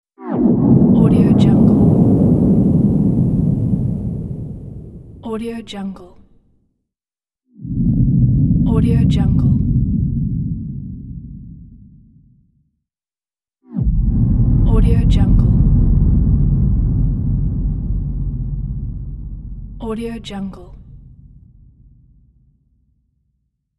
windup swoosh pack

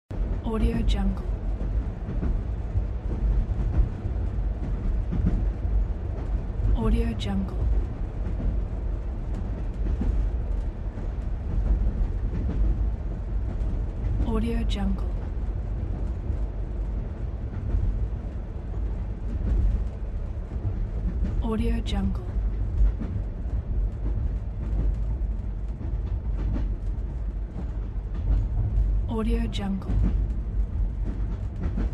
passenger trains

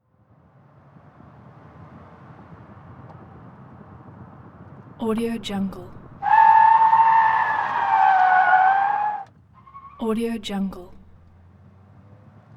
car race skid accent

